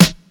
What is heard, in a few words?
old school tight low end rap snare drum sound f key